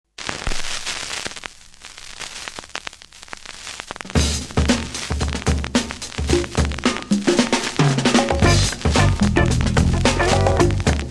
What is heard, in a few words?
breakbeat